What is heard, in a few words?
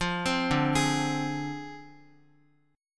D-Minor 7th Flat 5th, D, Guitar, 4F, 4A, 5C, 5G, 5C, 6E; arpeggiated; chordshape